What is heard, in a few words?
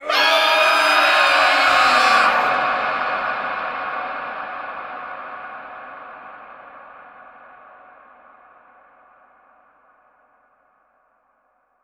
Reverb Athmospheres, FX Vocals; Vengeance Samples